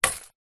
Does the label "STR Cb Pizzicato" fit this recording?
No